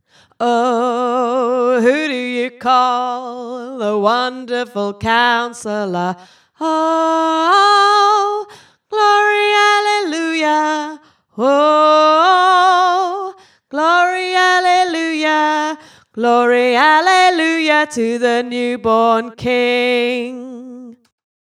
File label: alto; wonderful counsellor